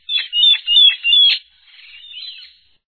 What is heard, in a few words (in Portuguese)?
anaca